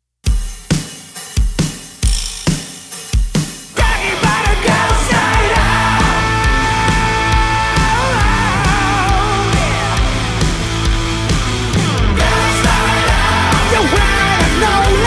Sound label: music sample